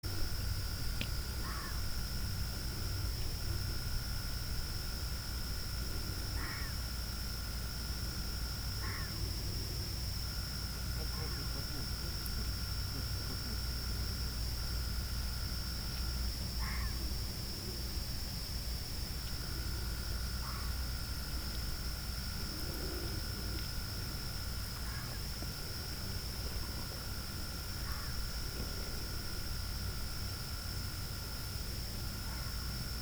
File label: corsac fox vulpes corsac turcmenicus